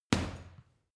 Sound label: boxing punching bag uppercut hard